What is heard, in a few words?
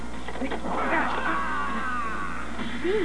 Menu popup; fatal fury - kaero